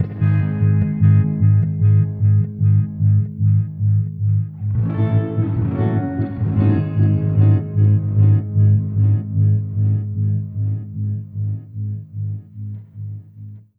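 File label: Rhythm Guitars, G#; Zero-G Kocktail Kollection; Construction Kitz (Lounge); Volcano at Sunrise 074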